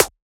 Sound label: Perc, Percussion; FLPRO